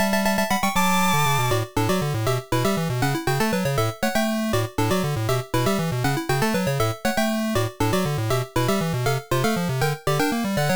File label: GB Sounds; Pokémon HeartGold & SoulSilver